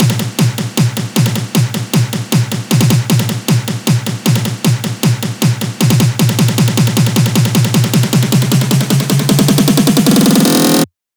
buildup loop 155; GhostHack

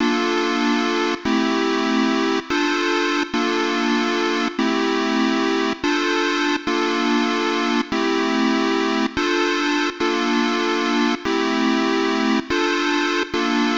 144 BPM D Min Main Synth, loop stems; Cymatics; 2022 Melody Collection; Wavy; Jupiter

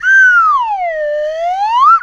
whistle with sliding tone, Cartoon Film Effects

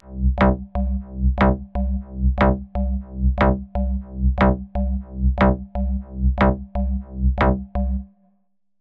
Synth Cm 120Bpm, House; Musical Loops; House Kit; Ghosthack; Advent Calendar

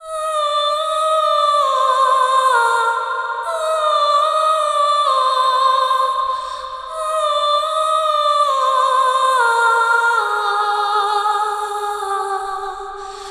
Panned Oh Vocals Outro Cm 70bpm (WET); Construction Kits; Ghosthack; SkyRealm; Kit 19 - Ode To Daenerys

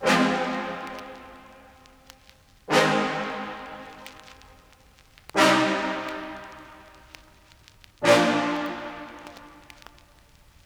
Classic Melody Loop 90 BPM C Min Brass; Melodics; Loops; Loop Stems; Cymatics; Eternity

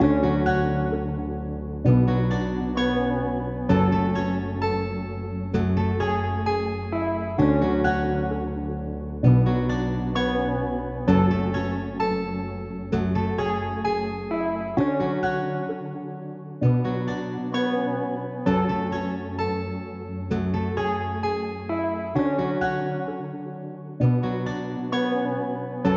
Sad Melody Loop - 130 BPM F# Min; Cymatics; Oracle Sample Pack

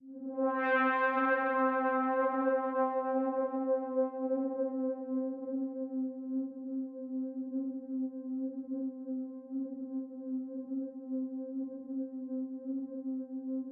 PAD Climb (C); Melodic One Shots; Cymatics; GHOST (Beta Pack)

